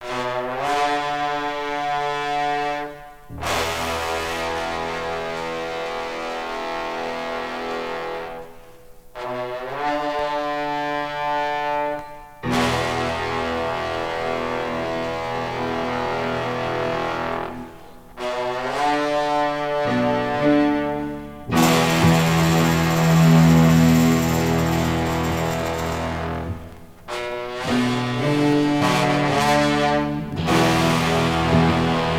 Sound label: horns, phonky loops